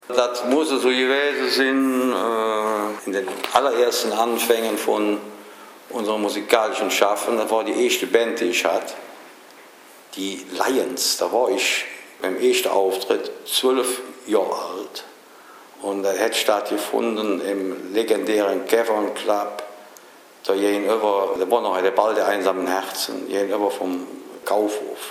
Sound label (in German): Horn